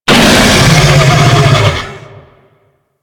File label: Infested Bone Blade Vocal Death E; Warframe